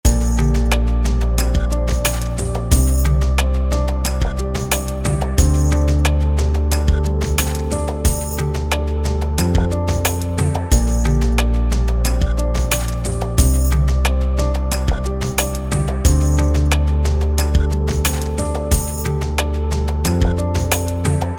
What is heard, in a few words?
loop dramatic